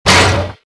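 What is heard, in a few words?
run, footsteps; Star Wars Jedi Knight II Jedi Outcast; XBOX; mark1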